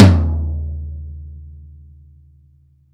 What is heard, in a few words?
TOM D, Drum Dry; East Collexion; Drum 1